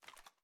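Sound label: submachine gun inspect; gmod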